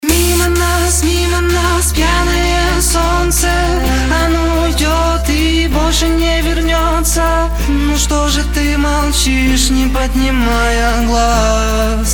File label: sax radio mix